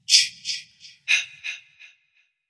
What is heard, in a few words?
sound effect chchch ahahah ki ki ki ma ma ma; friday the 13th